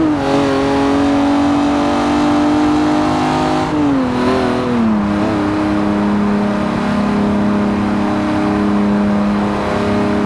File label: fourth cruise, vehicles; bmwm3gtr